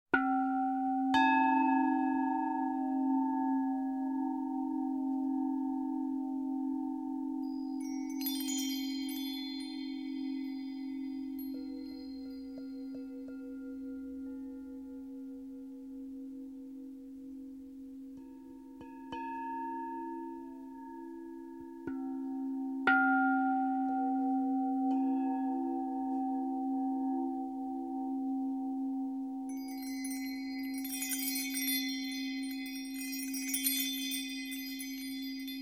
Bells and Gongs

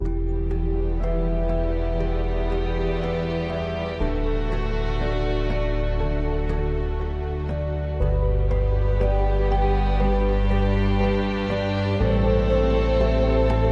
Ambient

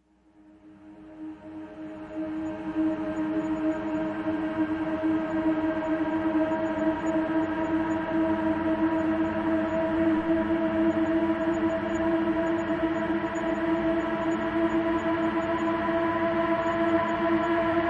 Rise; Ninja Tracks

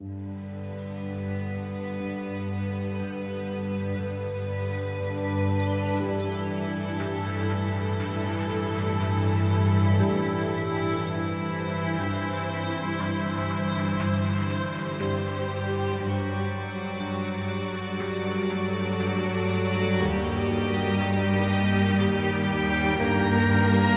Immortality Ambient

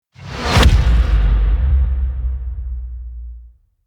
Blare Hit Swell; Ninja Tracks

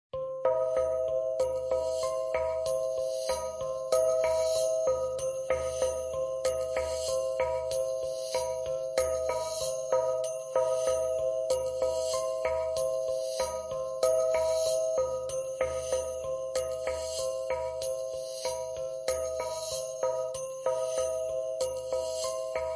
Percussion; Fired Earth Music

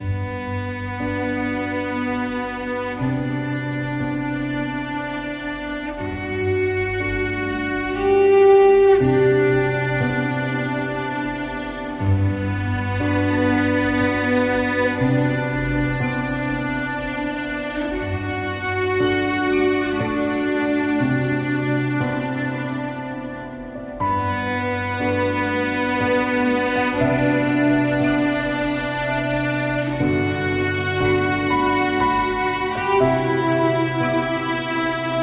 Chilled Ambient

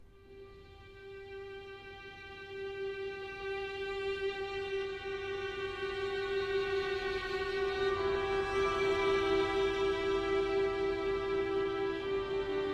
Cherishing Ambient

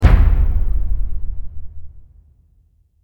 Hit Deep; Ninja Tracks

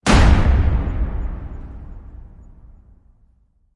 Crane Hit Epic; Ninja Tracks

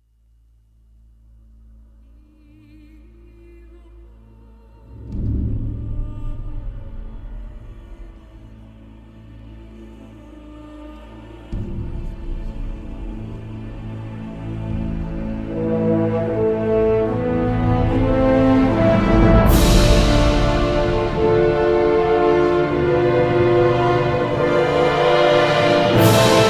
Warpath (Orchestral Version)

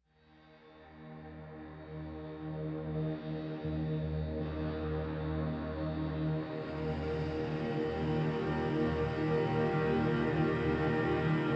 Reborn No Piano No Sub; Ninja Tracks

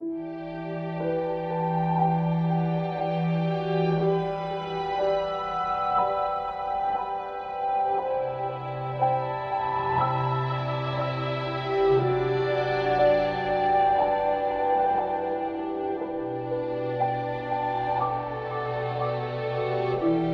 Tragedy Ambient

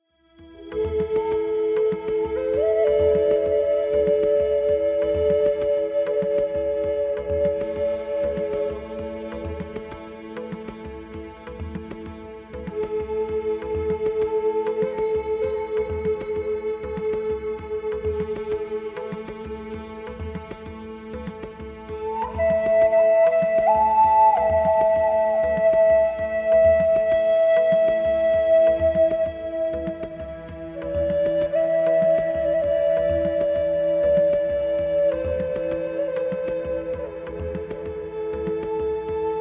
Native American Flute & Tabla

